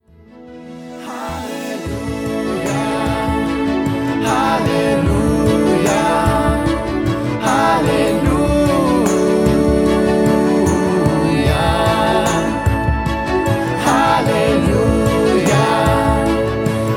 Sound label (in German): Halleluja; Die Weihnachtsgeschichte